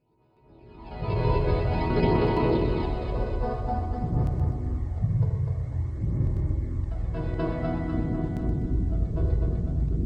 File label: C Augmented 60, Deconstruction